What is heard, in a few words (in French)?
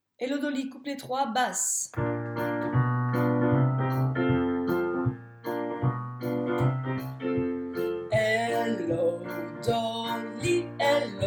basse; pupitre basses